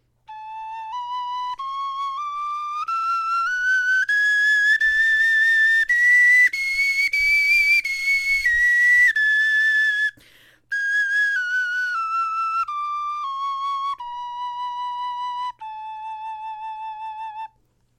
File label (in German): tonleiter, knochenfloete